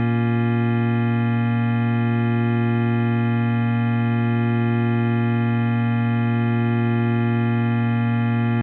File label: bbm chord, Omnichord